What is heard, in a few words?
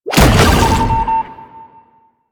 Proto Infested Brachiolyst Teleport Stop; Warframe; Abilities